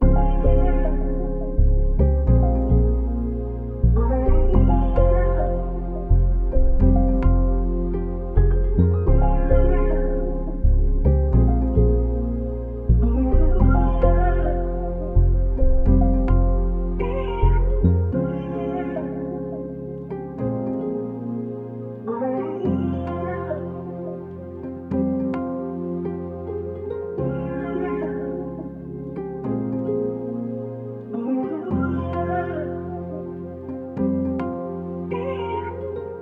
104 BPM C# Min (Latin), Melody; Cymatics; PARADOX II; Melody Collection

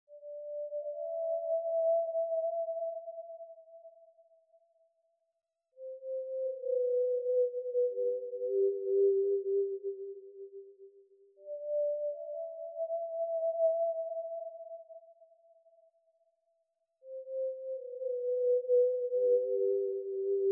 170 BPM C Min Lead; Loop Stems; Cymatics; Utopia

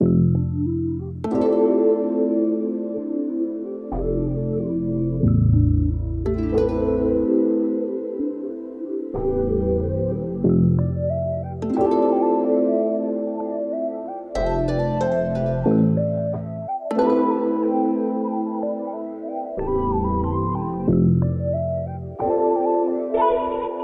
92 BPM A Min; Cymatics; Zodiac III Beta Pack; Zodiac III Production Suite

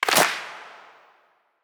Flam Clap; House; Drum One Shots; Claps; Cymatics

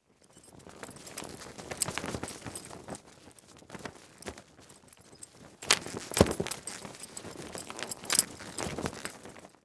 Flag Flaps with Metal Clanks; Fairlight Sound Lib

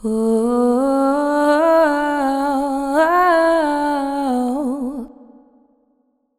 Oooh, Vocals, Wet; GhostHack

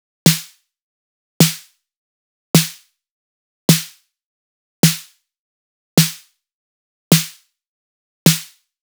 Machine Drum Loop 105 BPM Snare; Experimental Drum Loops; Loop Stems; Cymatics; Mystery Pack Vol 4

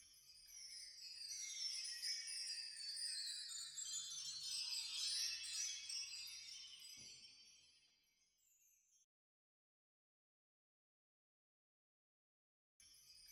75 BPM A Min Chimes; Melody Loops; Nationals; Retro Collection; Cymatics; ANALOG EVOLUTION Beta

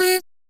Dry One Shot - F#, Vocal; Tonal One Shots; Cymatics; Euphoria Vocal Sample Pack

